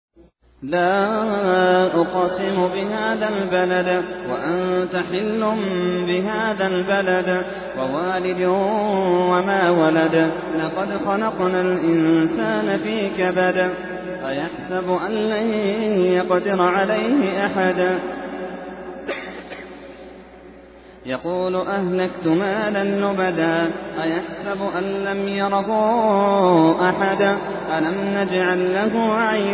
ترتیل; سوره بلد

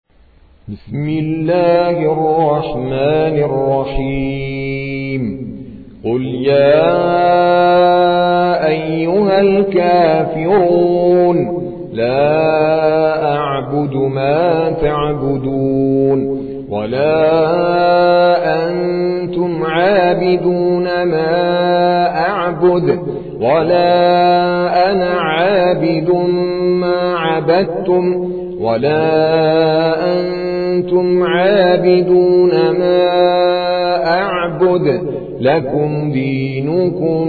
ترتیل قاری هندی; سوره کافرون

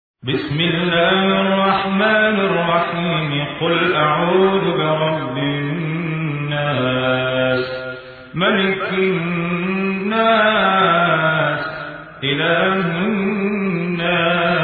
ترتیل قاری مصری; سوره ناس

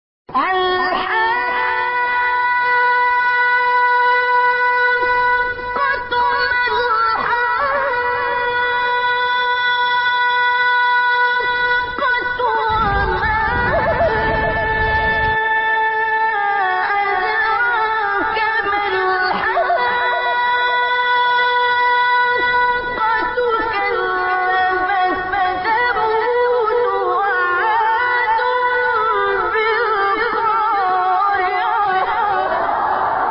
تلاوت سوره الحاقه; استاد عبدالباسط

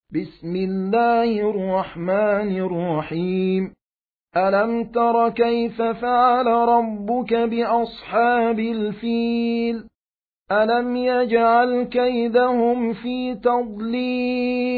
ترتیل; سوره فیل

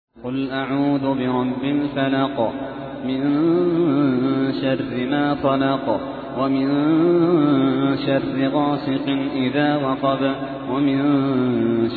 ترتیل; سوره فلق

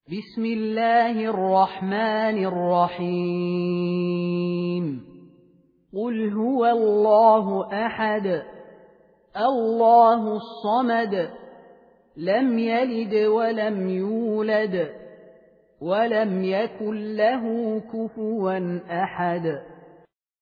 سوره اخلاص; خالد المهنا

